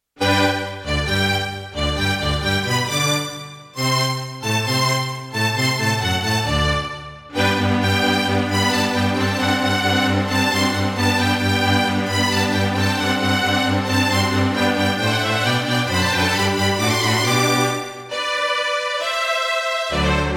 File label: Roland SC-55mkII; classical; Mozart; Eine Kleine Nachtmusik partial